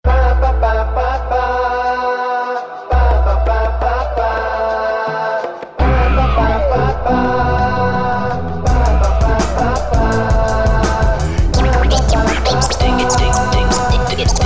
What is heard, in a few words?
Bonus Scratches; Burntablism Volume 2